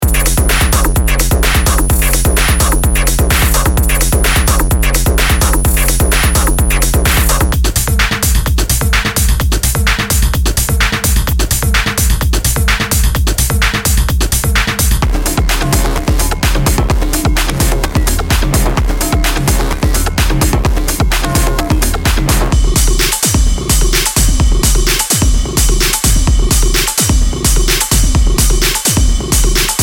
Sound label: busted techno